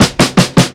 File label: snare quarter; Drums; One Shots; Slate; AURORA Sample Pack; Snares